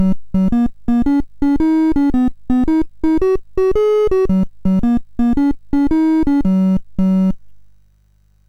MOS6581R2, GAMES; Sammy Lightfoot

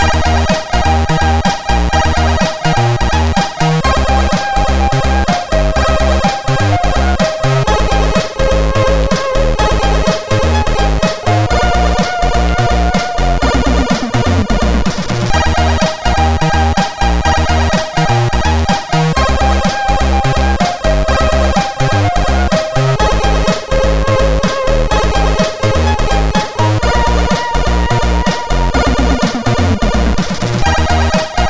sid MOS6581R2; No Mercy

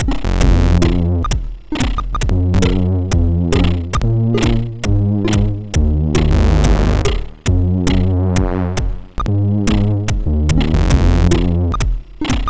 Intro, MOS6581; Pocket Money Intro

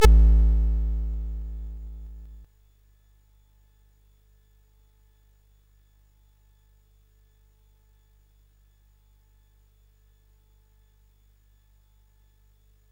CSG8580R5, GAMES; Witch Switch

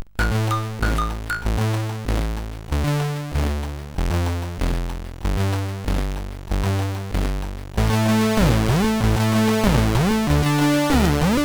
New Digi, CSG8580R5, sid

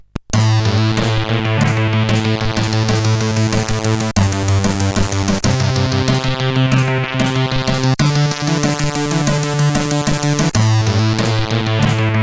Dark Intro tune, sid CSG8580R5

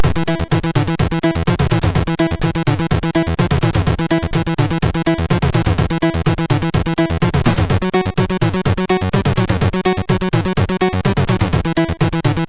Jingles, sid MOS6581R2

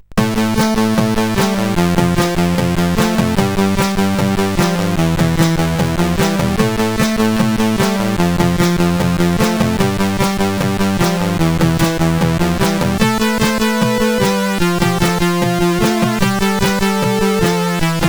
Intro, sid CSG8580; Afros

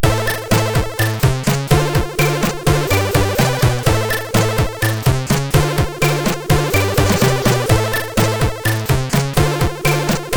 sid, MOS6581R3

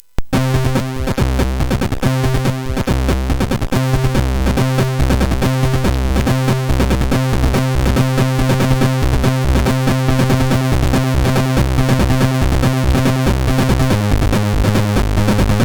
Meditationheart, MOS6581R4; hvsc

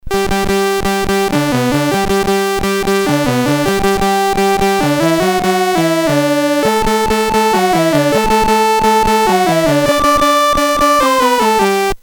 CSG8580R5, GAMES; Solitaire

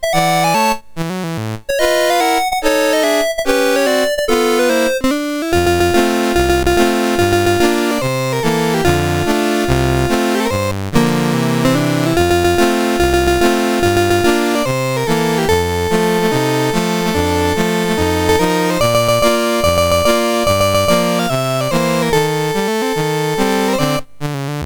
MOS6581R4; Dave Marquis

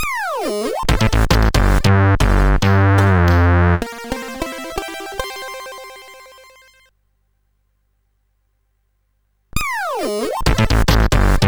Su-Sweet, CSG8580R5; sid

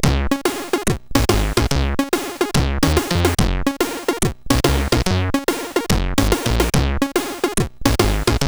Tests, CSG8580R5

